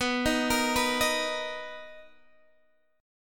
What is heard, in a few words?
B Major 7th B down Guitar Open D